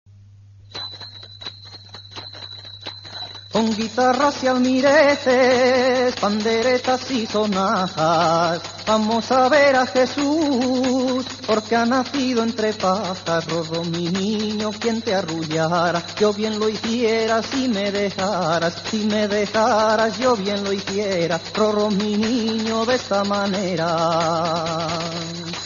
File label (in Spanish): guitarras